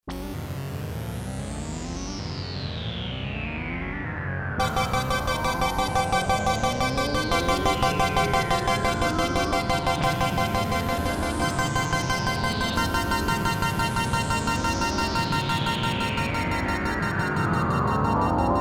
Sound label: Split - QUANTIZED LFO - TRIG PULSE WIDTH, Oberheim OB-8; Splits & Doubles